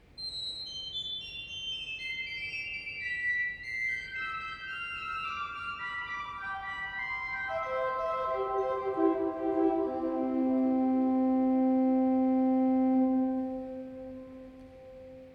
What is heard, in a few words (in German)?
prinzipal 2, Prinzipal 2'; kirchenmusik; pfeifen; gereon koeln